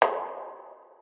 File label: clap